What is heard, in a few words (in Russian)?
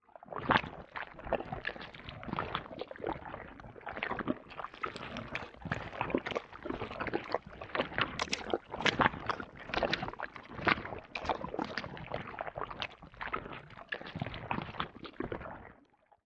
zvuki cherviakov